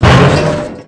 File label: hit